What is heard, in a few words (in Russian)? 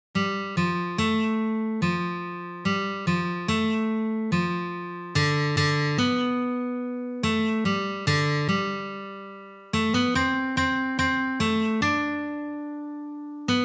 na gitare